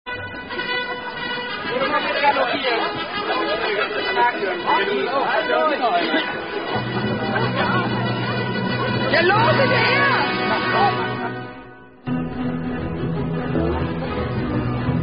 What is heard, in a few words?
Strange German Fake Polo Drinking Game; Steckenpferdpolo